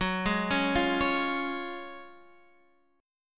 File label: F sharp Major 7th Suspended 2nd